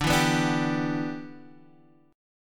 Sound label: E Minor 7th D